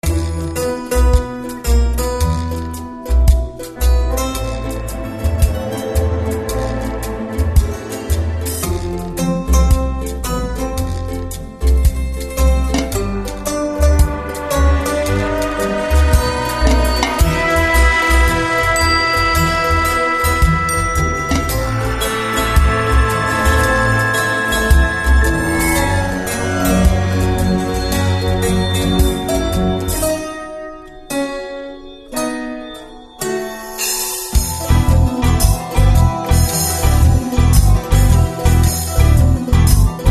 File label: opening theme tone; Soundtrack Dexter